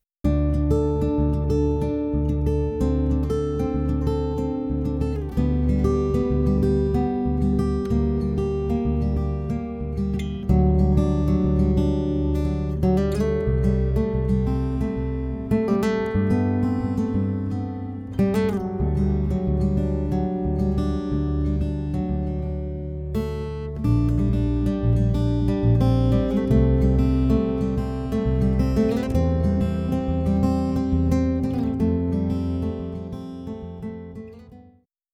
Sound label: Acoustic Instruments